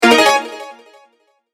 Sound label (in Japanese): 出題